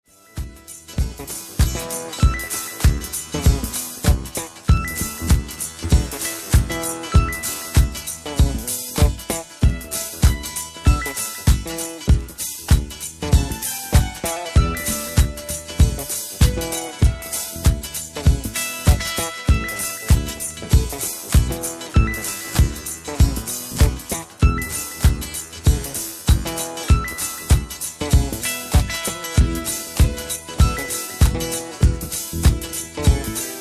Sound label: Funky Bass; funk soul